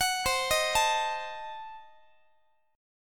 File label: C Diminished 7th, Guitar, chord; Cdim7 arpeggiated